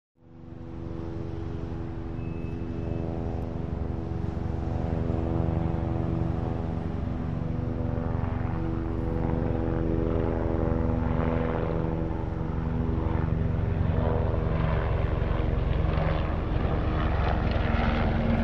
Helicopter In And By Overhead, Medium Perspective With Distant City Ambience.; heli fx